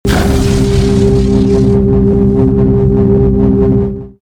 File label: sphere; war3source; chronos